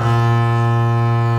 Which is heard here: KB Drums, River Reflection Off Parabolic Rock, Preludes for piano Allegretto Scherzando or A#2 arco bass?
A#2 arco bass